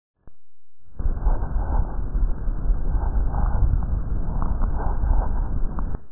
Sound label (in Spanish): Neumonia transmicion voz